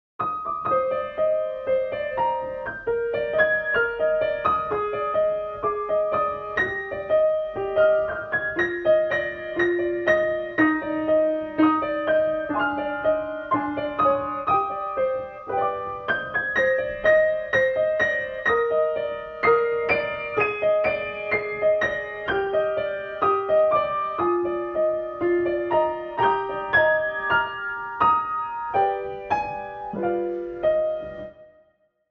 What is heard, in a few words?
kris piano prophecy; DELTARUNE